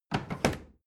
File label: bathroom drawer open heavy